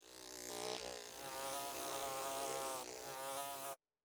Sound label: bee